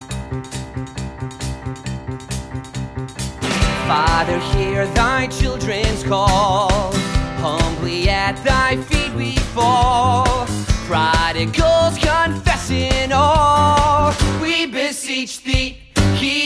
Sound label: we beseech thee vocals; amp regional arts and media program